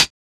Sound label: Closed Hats